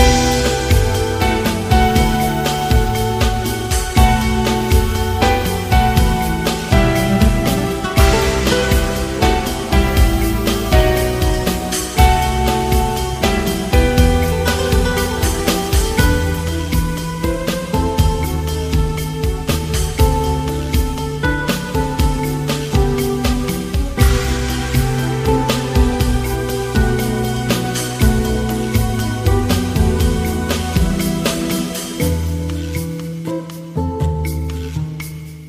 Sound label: Hollywood Instrumental Ringtone; ringtones